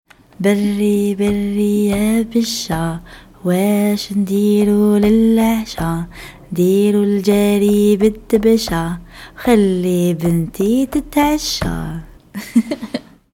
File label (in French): Chant 2; ABBAYE